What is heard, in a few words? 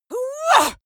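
female combat attack long, screams; schreie; menschen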